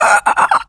pain, Zombies; zps; lea

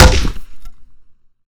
Bat Hit, Melee; zps; Weapons

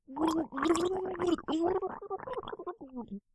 female drown death; zps; Humans

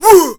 Melee, Melee Grunts, Human Punk; zps; Humans

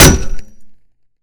Chair Hit, Metal Chair; zps; Weapons; Melee

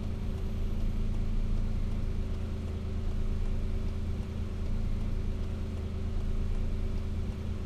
Car idle Blinker; zps; Ambient; Vehicles

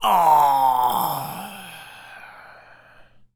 Male Death, Zombies; zps; Male1

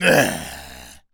pain, Zombies; zps; Z Cop

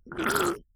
female drowning; zps; Humans

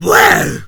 attack, Zombies; zps; Z Punk

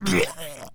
Male Pain, Zombies; zps; Male1

